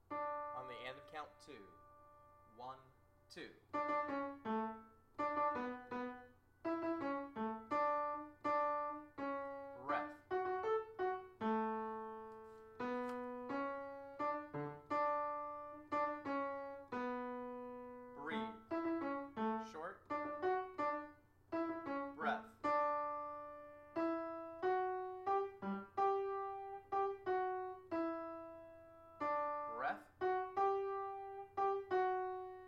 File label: Hall Tenor, hallelujah chorus